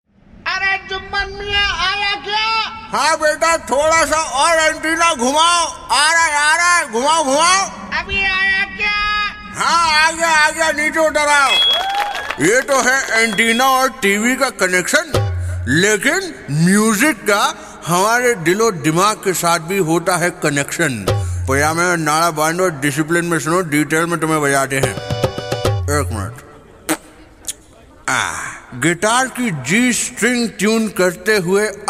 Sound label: guitar during operation; juman miya